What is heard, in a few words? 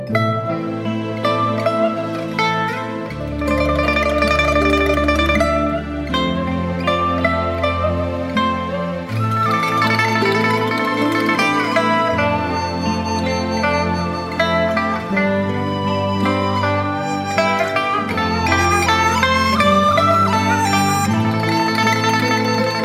chinese melody; ringtones